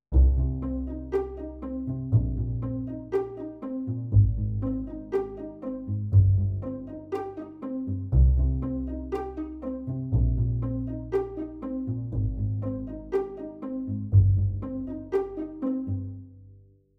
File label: Session Strings